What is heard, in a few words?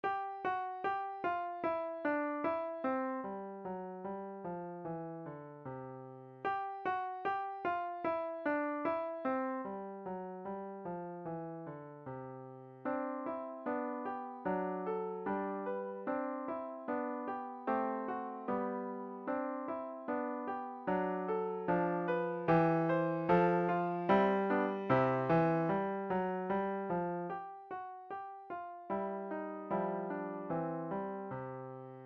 Swinging, beginner piano music